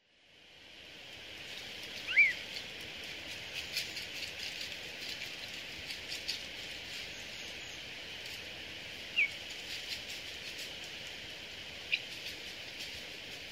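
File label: Hazel Grouse, doto; koe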